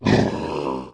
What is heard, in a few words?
snake damage